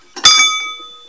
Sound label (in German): mech ton